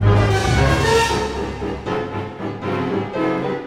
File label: Orchestral Loops